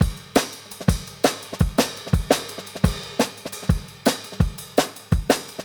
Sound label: 170 Ride Clean, Live Jungle Breaks; LiveJungleBreaks Mini SP